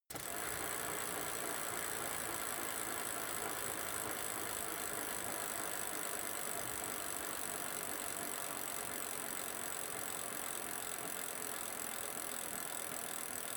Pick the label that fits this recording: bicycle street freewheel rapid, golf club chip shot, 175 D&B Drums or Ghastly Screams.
bicycle street freewheel rapid